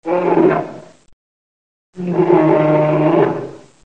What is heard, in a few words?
Tiger